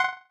text Error; NVDA

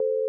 Skype Dtmf star; Skype Sounds

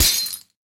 lose; RS Games Sounds

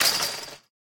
wall fence; Golden Crayon Sounds

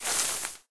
thick forest step; Golden Crayon Sounds

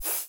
Trigger Sound Off, default iPhone sounds; IOS 9 sounds

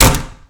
wall wood; Golden Crayon Sounds

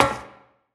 metal step; Golden Crayon Sounds

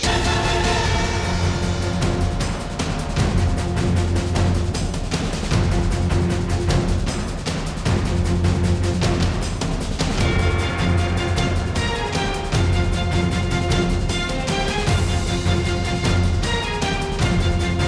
battle, music; Manamon Music; Manamon 1; octoross

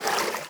swim step; Golden Crayon Sounds

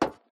metal step; Golden Crayon Sounds

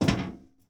catwalk step; Golden Crayon Sounds

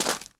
gravel step; Golden Crayon Sounds

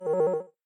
Photos Sharing Post Tone; iOS Sounds

